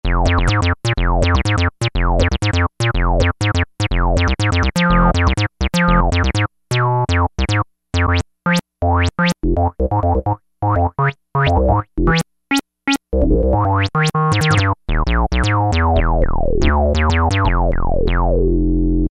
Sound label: bas, E-mu Orbit V2; Factory presets